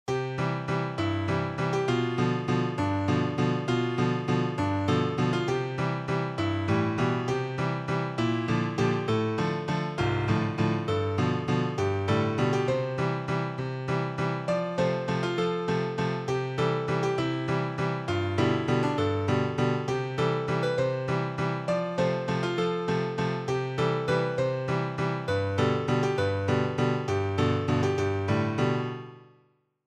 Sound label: Piano F